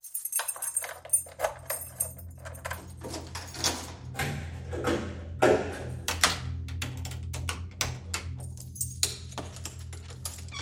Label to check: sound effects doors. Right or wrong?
Right